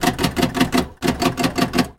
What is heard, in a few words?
display a pinball score